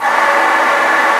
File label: Trombone Air; Digital Sound Factory Virtuoso